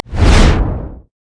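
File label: armadillo defensive ball curl; rammus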